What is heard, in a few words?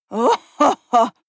female laugh mocking